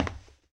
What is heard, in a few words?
glass step; Golden Crayon Sounds